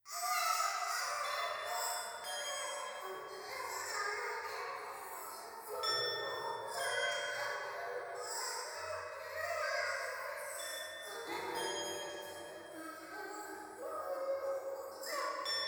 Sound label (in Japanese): 教会 シャブ喘ぎ 寺